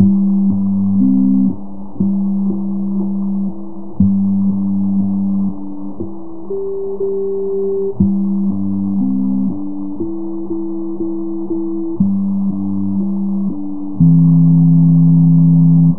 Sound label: Mary MIDI, 2200Hz